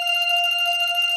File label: countup loop; netent